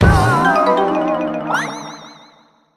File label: puzzle lose